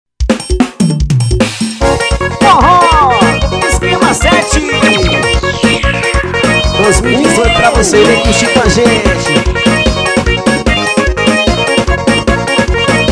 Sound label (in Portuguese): tocando todos os ritmos